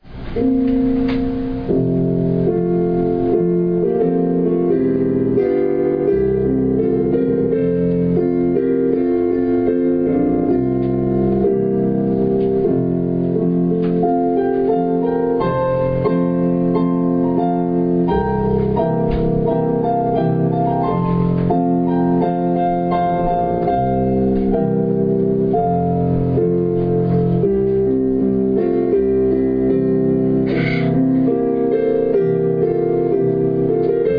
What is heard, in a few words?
Piano prelude